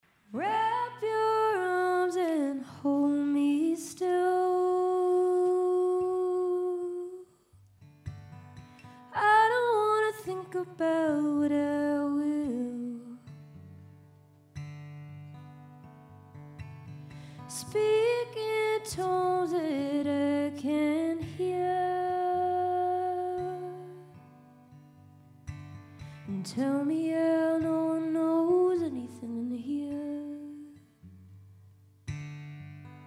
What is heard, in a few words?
bird